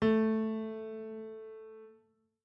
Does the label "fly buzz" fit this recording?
No